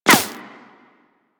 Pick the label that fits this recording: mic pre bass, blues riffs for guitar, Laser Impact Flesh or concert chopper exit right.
Laser Impact Flesh